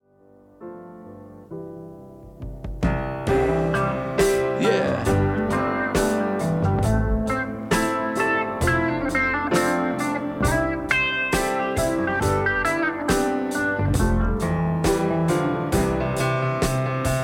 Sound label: leslie slow speed